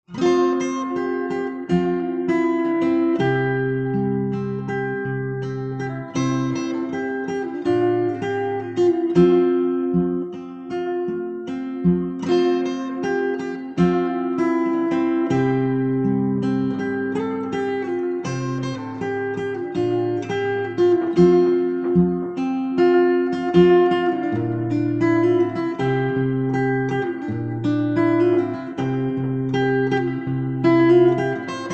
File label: Guitar Slow